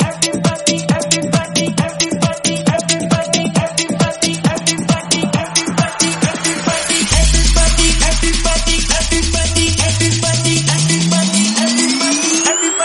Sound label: 135 174bpm Dm; Tranition